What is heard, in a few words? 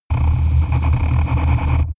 space laser blaster massive; krieg-waffen; soundpool